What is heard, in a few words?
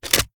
reload mag out; gmod